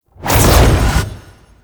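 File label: Teleport Start; Enemies; Abilities; Warframe; Tau; Dax Ninja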